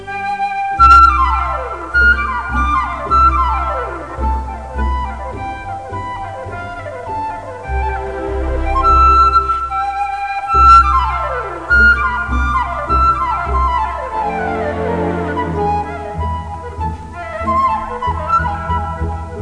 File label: FLUTE